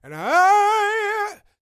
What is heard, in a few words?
Vocal Gospel; Vocal Planet Vol1 Gospel